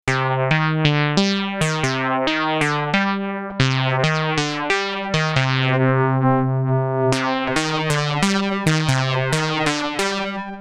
Filter FM Oktaven

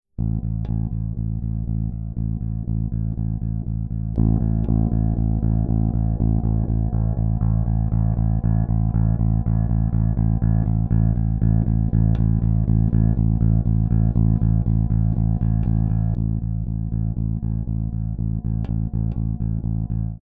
EBS DRIVE 0 TONE variabel LEVEL mitte CLEAN voll

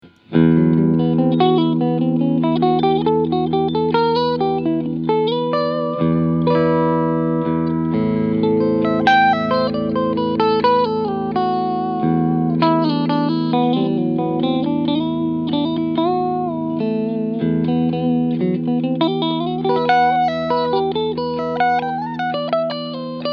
Cleansound beide Humbucker Singlecoil Mode; Chapman ML1 RS; Rob Scallon

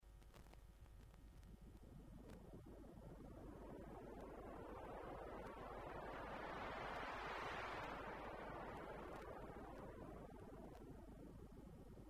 Gefiltertes Rauschen

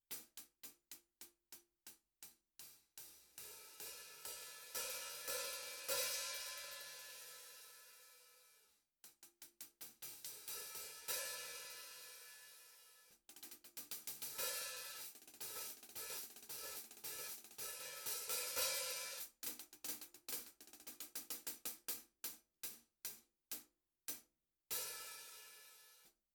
Test Impulz HH; Zultan

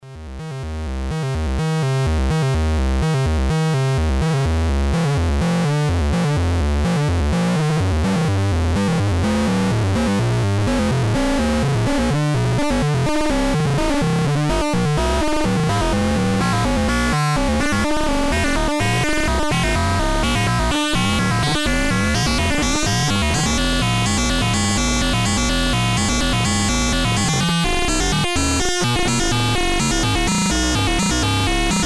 VCO FM Level manuell geregelt VCO II ist Modulator; ERICA SYNTHS Black System Black

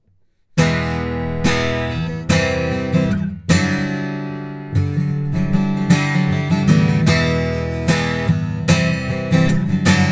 Akustikgitarre Manuell 3 Kanal; Tascam Portacapture X6

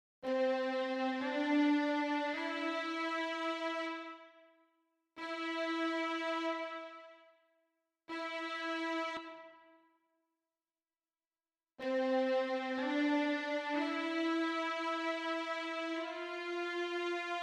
Violins Wechsel Artikulationen

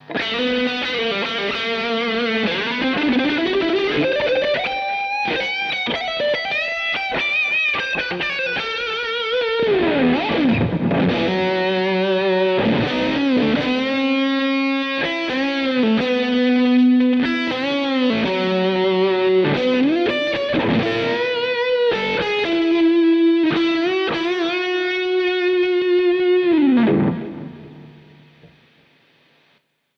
Amber Overdrive Custom Drive und Tone 3 Uhr

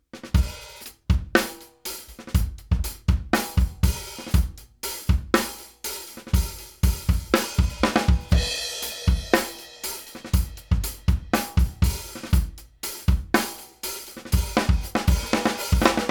Summit Audio TLA 100A Drum Bus Attack Medium Release Fast max. Reduktion 3 dB

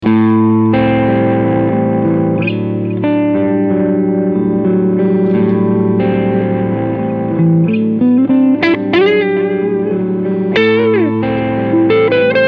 Crunchsound beide PUs

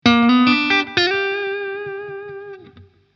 Blues Licks Tonika

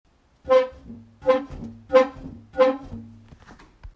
Quietsch; JDK

